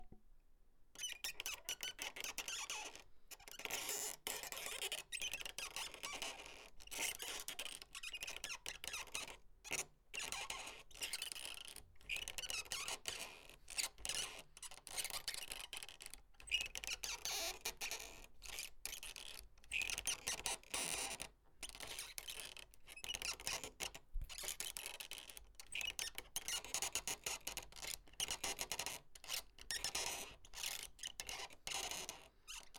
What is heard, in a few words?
Creaking Handle